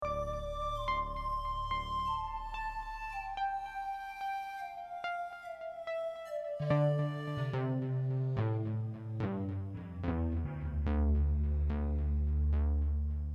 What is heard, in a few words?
riff